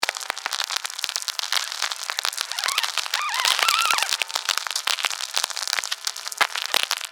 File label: Cloud Noise